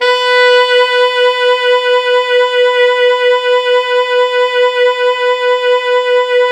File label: Pop Brass, B3 -L; Best Service ProSamples vol.19; AKAI